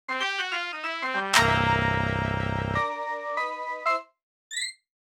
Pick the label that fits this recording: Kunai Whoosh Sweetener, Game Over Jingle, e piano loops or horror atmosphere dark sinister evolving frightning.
Game Over Jingle